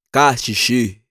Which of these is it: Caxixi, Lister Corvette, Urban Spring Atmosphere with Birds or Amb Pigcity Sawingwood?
Caxixi